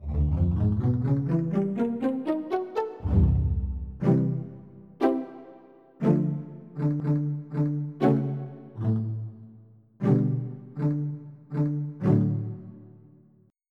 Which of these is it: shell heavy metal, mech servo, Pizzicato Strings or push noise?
Pizzicato Strings